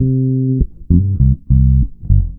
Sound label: Organic Bass; Trip-Hop; Loops; Sonic Foundry (Sony Creative Software); Rondo Brothers Trip-Hop Loops & Samples; El Corazon